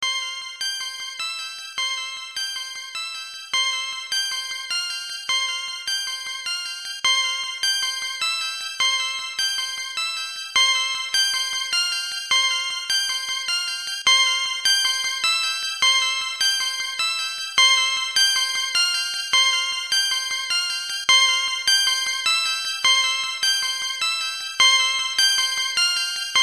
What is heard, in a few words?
Level Up; EarthBound